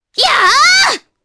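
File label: Vox Attack, Japanese; voices; heroes; Mirianne; kingsraid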